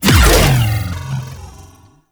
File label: Grendel Deluxe Consume Impact; Warframe